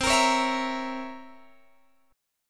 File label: C 7th Flat 9th C Guitar Open G